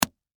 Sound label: Impact Slap Face Smack; Slaps; Combat